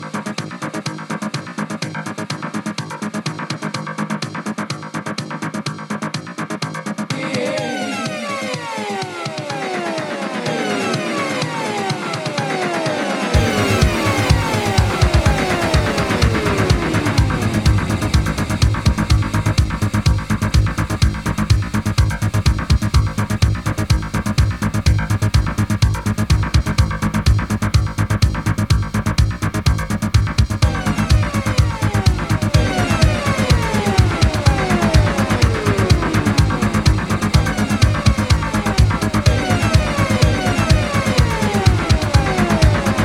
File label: 125 BPM